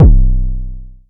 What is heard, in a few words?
analog attacked, kick; Ableton Foundation FreePacks